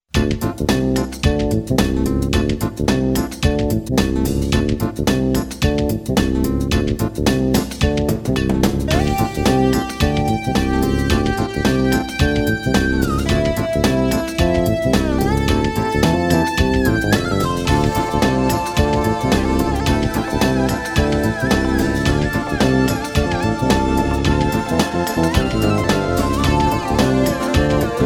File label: slapback